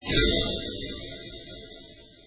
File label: sound bell; xmas